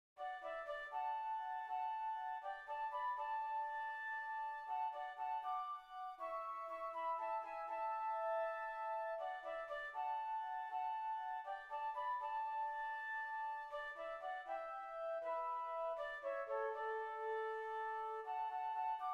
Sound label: Flutes; Blessed Assurance